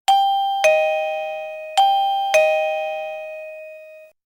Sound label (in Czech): melodie